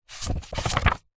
household book thick hard back cookery page slide turn; zapsplat